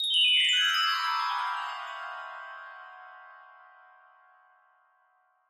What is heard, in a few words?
Orchestra Bells Magi, mallets bell